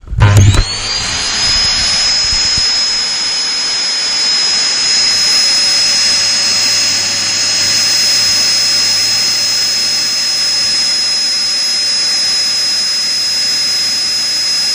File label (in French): aspirateur